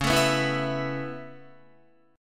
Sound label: Db Minor 7th Sharp 5th, Guitar, Dbm7#5 chord; strummed; JGuitar